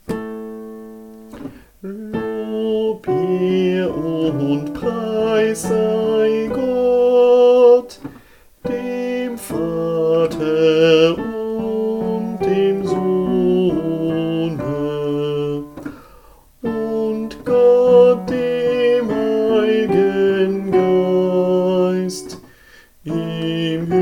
Sopran, Kirchgemeinde; Lob, Ehr und Preis

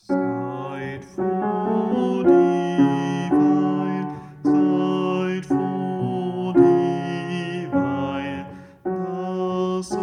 Seid froh Alt

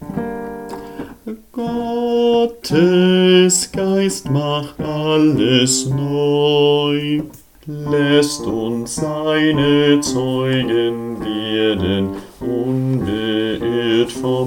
Jauchzet Bass

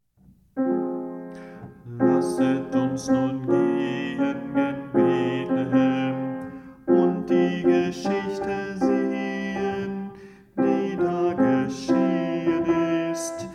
Alt, bach choraele alt

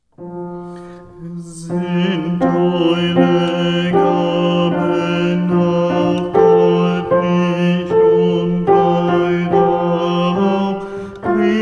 Wiegenlied B, adventsmusik bass

